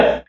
Loop, Chamberlin Rhythmate

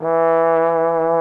VIBRATO TROM

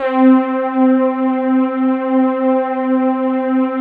PAD, DIGI-PADS

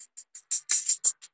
LOOPS

